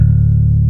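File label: fretless D2, bass; AKAI S6000 CD-ROM - Ultimate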